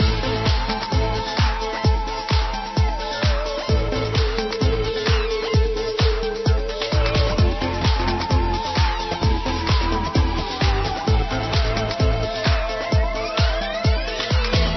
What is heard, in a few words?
Catacombs Loop; monster high creeptastic catacomb adventures